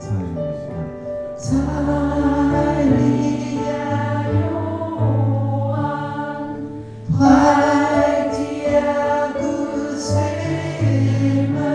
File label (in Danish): dejlig er jorden alt